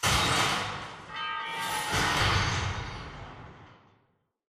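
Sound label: generator destroyed metal distant; coop; Titanfall 2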